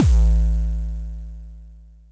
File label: kick